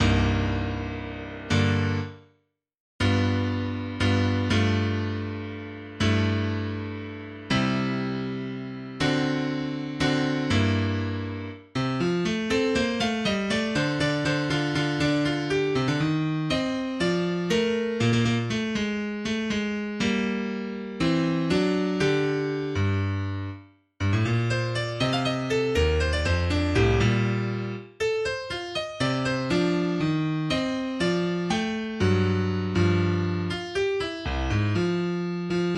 Violin And Cello Duet

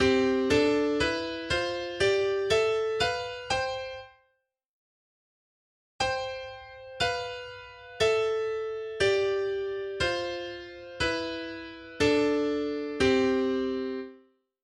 Paralel Fifths

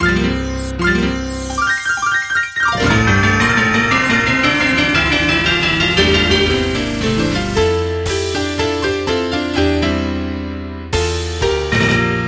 fanfare with piano bells timpani and drum kit; Paramount

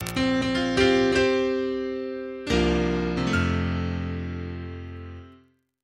Feature Presentation Jingle

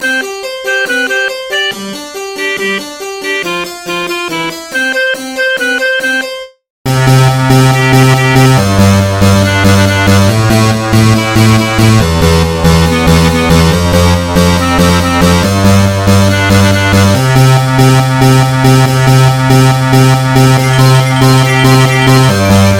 Marching 8bit

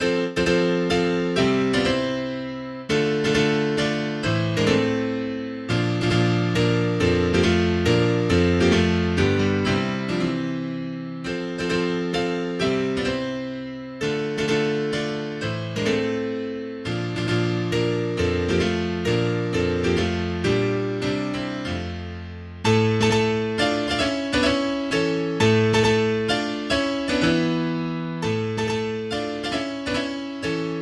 in F Major Allegro ma non troppo; Mazurka No48; Opus 68 No3

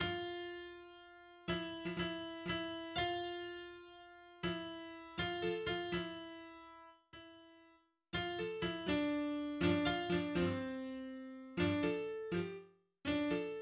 Gitar

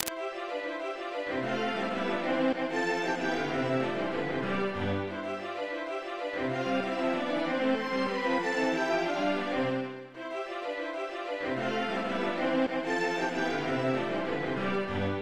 String Quartet in C major; MIDI; Mozart; No.4=3

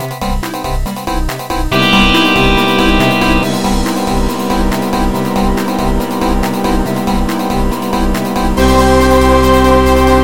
Techno

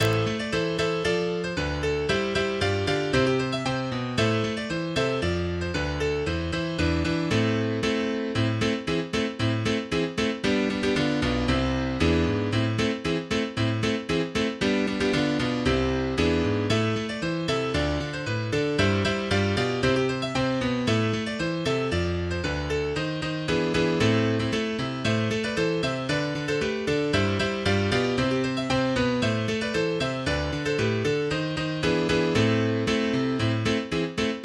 drum

